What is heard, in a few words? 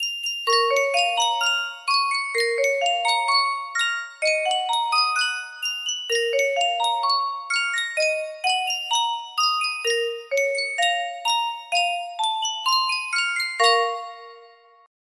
custom tune music box